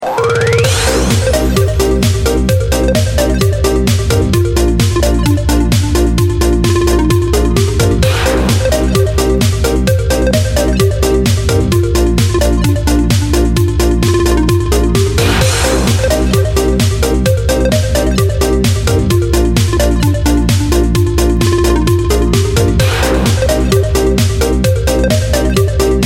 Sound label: Pop 80